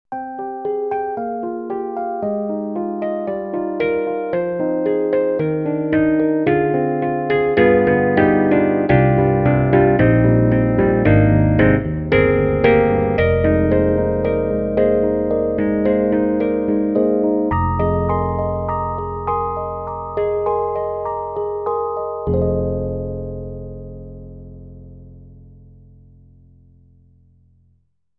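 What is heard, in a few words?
SD-90 Classical Electric Piano; EDIROL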